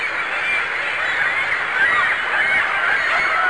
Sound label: seagulls; Cross Racing Championship